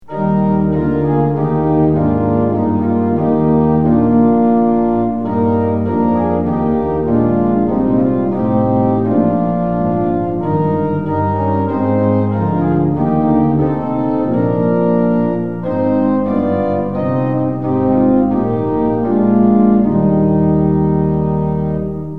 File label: tune; hymns; lillenas